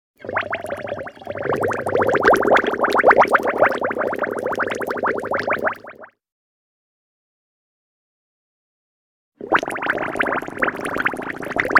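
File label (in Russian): пузыри водяные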